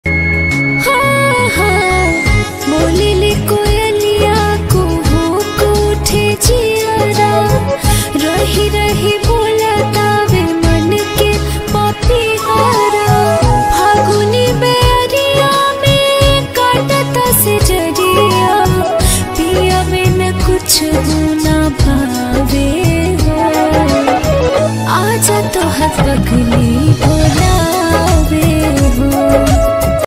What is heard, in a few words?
bhojpuri ringtone